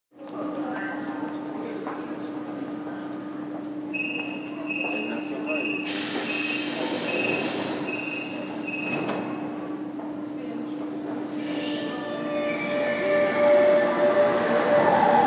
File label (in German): S Bahn Abfahrt Bahnsteig